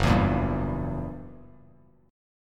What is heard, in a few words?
Gb 7th Flat 9th Gb, Bass Standard; strummed; JGuitar